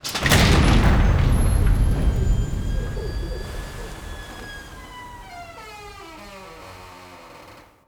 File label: VAULT DOOR LARGE